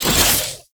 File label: Whoosh, weapons